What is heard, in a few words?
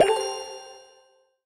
bonus low symbol; Fishing For Gold